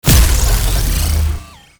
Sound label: Infested Lich Parasitic Link Cast; Warframe; Abilities; Zeke